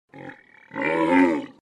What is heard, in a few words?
Camel; audio hero